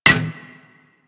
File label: Melee Staff Impact Generic